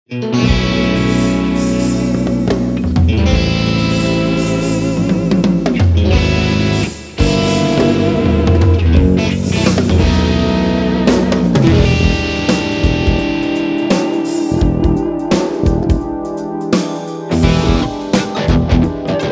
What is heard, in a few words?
Guitars Jingle; Madera